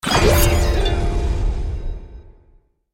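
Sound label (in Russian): vzmah magich palki, swish of a magic wand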